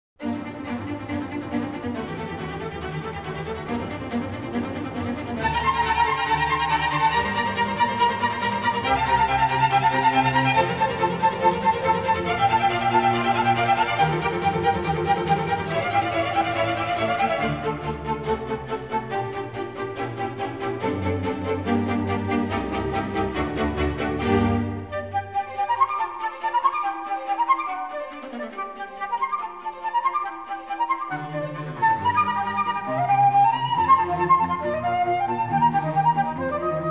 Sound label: Flute Concerto In G Minor, Allegro; Flute Concerto In G Minor, Op. 10-2, RV 439, -La Notte